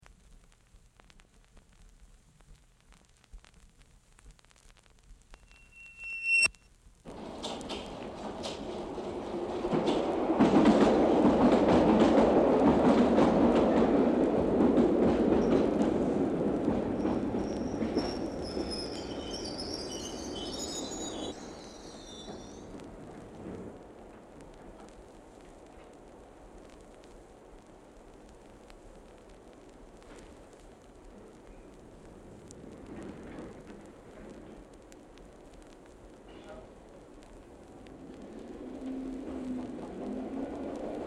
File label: Train arrives and departs; Tube; above